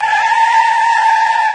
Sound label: Drift low; Dr. Driving; Android